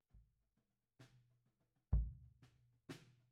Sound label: Kick Out, 96bpm